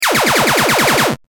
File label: full laser; arms